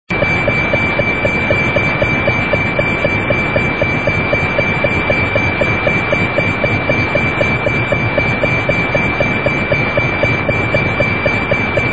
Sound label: apt beacon